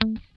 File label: GUI A3, E-GUI MUTED; Sound & Vision; Gigapack I CD 2